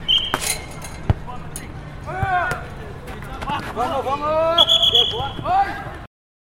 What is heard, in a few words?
Football ball hits fence referee whistles